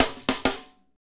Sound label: Hip Hop, Drumscapes Roland; Northstar